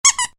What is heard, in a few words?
Squeak, Squeezy Dog Toy; Miscellaneous Toy Sound Effects; Miscellaneous Toy (Sound Effects:Toys); Squeaky toy; Stuart Duffield